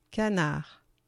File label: duck; FoodChain